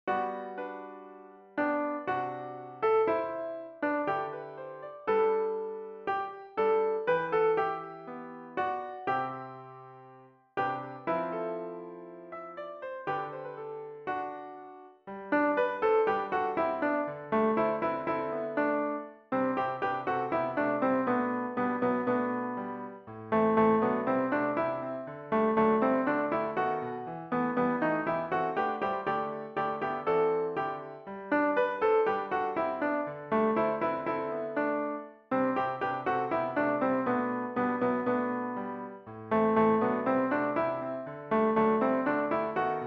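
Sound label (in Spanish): Contraltos, MIDI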